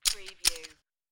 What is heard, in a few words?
Poker Chips Rattle Chips In Hand